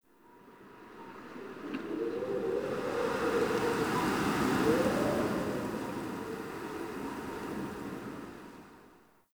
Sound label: Exterior Winter Wind One Off; Warframe; Ambience; NineteenNinetyNine